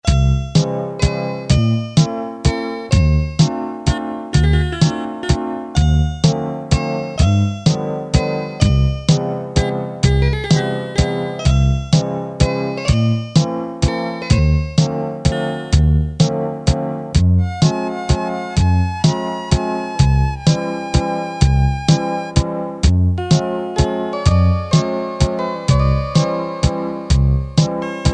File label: waltz, Casio MT-500 Rhythms